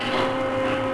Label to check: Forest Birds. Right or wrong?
Wrong